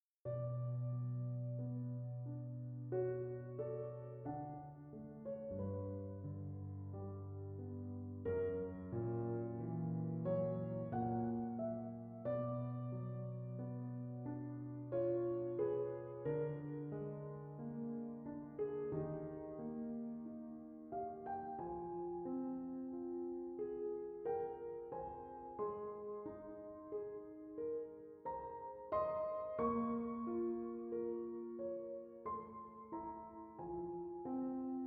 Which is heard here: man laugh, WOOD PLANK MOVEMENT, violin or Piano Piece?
Piano Piece